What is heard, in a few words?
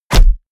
bow hit generic